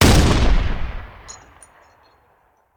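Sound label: Artillery Cannon Anti Aircraft; Sound Ideas; Guns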